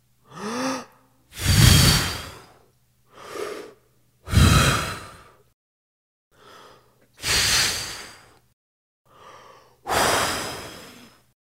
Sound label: blow candle